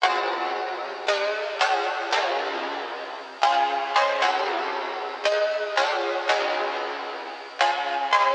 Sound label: Melody Loop Pluck Cm 115 BPM LoFi Ambient